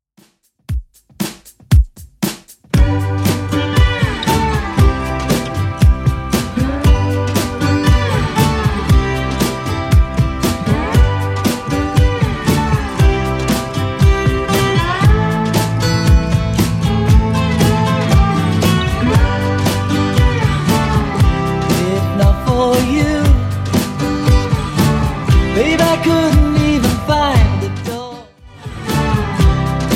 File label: 70s, 117bpm